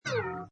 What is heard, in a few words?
Pinball Sound Tone; Video Games